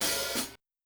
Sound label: Hihat Open and Close, drums